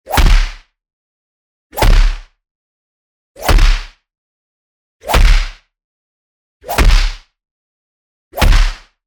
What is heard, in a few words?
PUNCH Low Ending; boom library; Anime Essentials